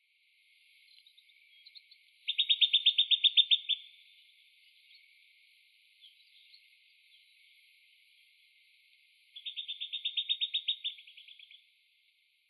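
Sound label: ikaruchidori, Long-billed Plover; nasushiobara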